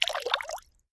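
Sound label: water bathing short soft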